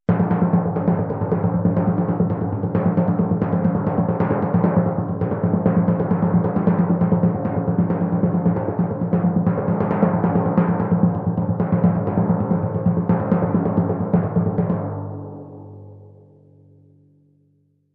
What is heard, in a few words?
Fast and straight timpani groove